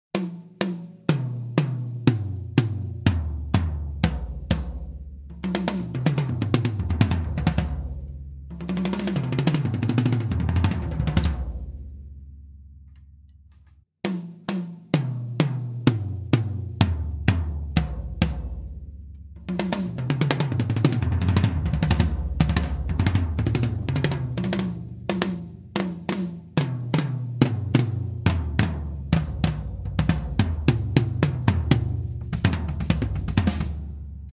Toms komp, Toms mit Kompression

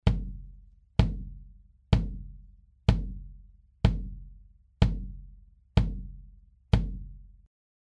Beta91 aussen

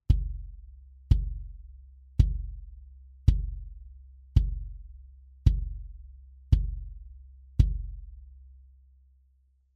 d6 innen, bd mics

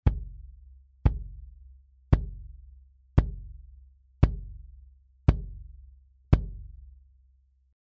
MD441 innen

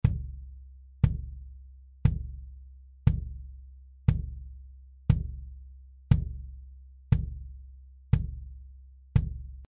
MD421 aussen

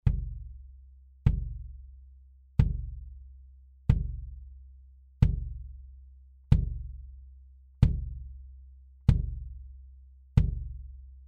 re 20 aussen

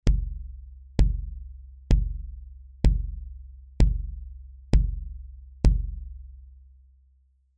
M88 aussen, bd mics

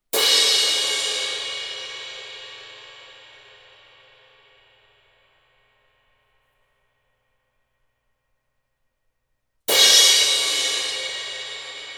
Mellow Crash nachher; beckenreparatur klangvergleich vorher nachher

